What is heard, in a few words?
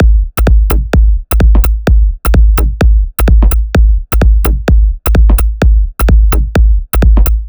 128BPM Kit; VFH1 128BPM Roboto Kit